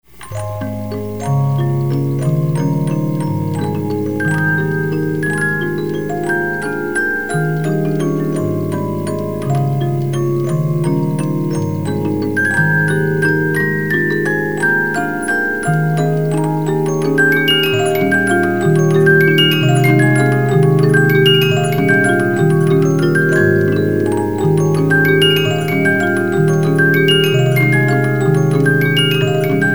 Grand Waltz, music boxes, 72-Note Movements

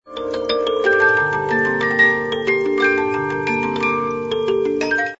The Moldau, music box 72-Note

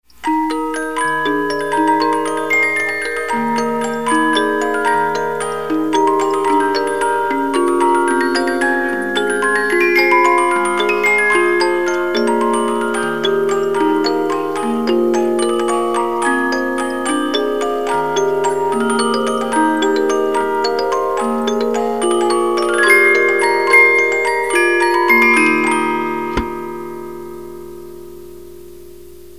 Waltz of the Flowers, 50 Note music box

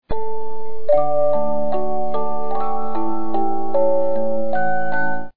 Tristesse, 72 Note music box

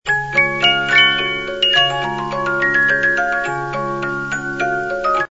Waltz of the Flowers, 72 Note music box

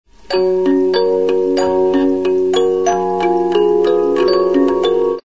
Eine Kleine Nachtmusik Romance, 72-Note Movements

